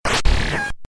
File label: choir; god of war